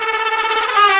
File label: trumpet danger; music